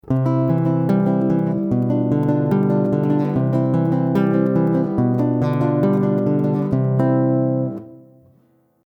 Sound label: record godin nylon